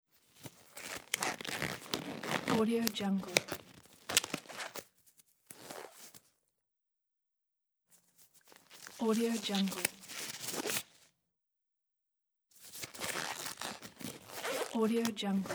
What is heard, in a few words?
rubber gloves; mountainaudio